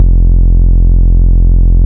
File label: Bass Shot F